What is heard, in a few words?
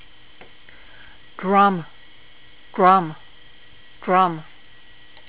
drum